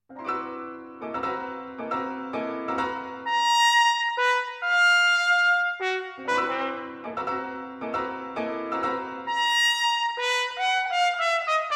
Trumpet & Piano, Incantation; Incantation, Thrène et Danse; Arr. For Trumpet & Piano

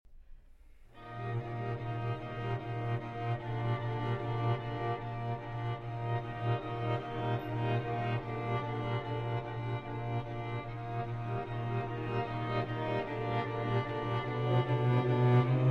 String Quartet; VI. Mishima Closing

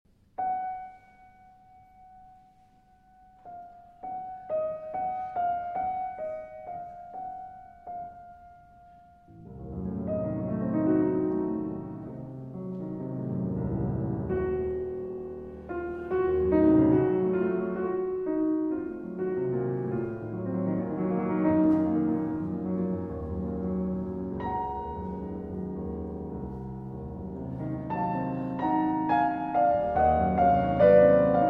Piano Pieces, Intermezzo, Andante; 6 Piano Pieces, Op. 118; Brahms; largo e mesto

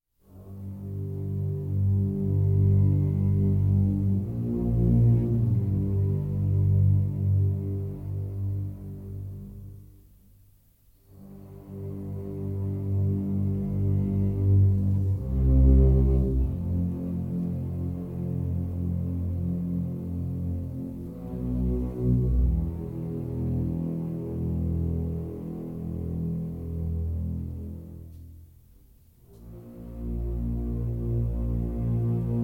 Without Clarinet

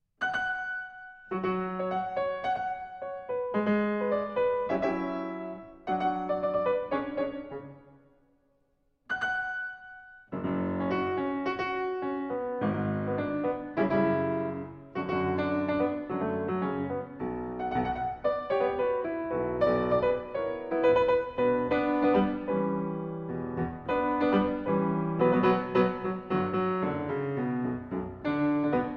African Pianism Builsa Work Song; Nketia

